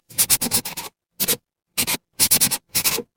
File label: office pen